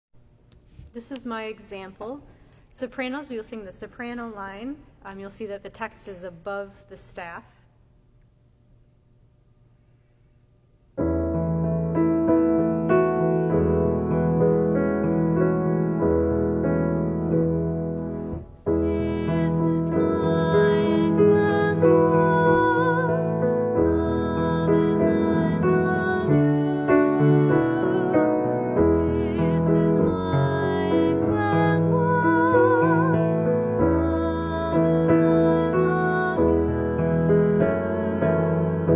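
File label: soprano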